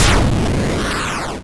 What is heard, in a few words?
medium, explosions